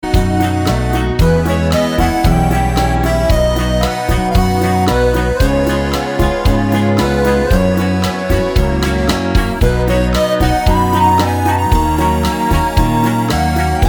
mix bachata

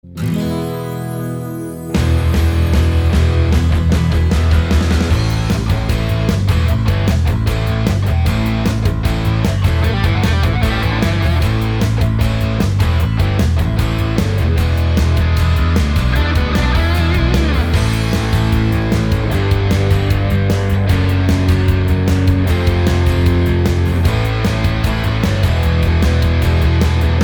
rock; digitalsong

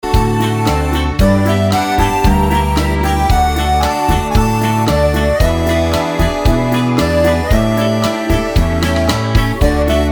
mix bachata

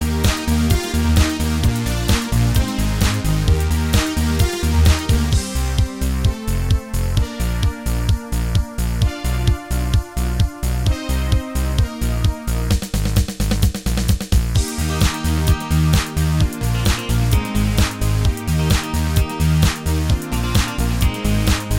dance

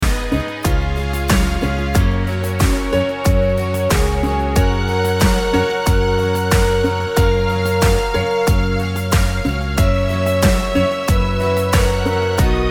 cumbia

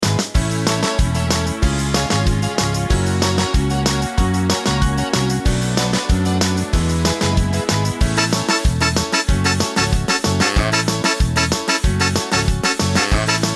twist

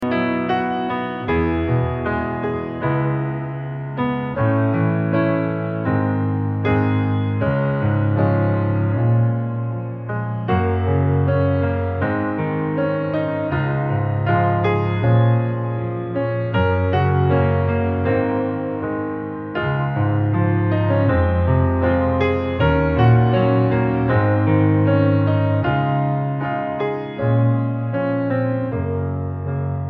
piano solo

